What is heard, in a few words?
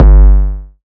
808 Bass Puncher G3; Slate 808 Deep Dive; SD Signature 808s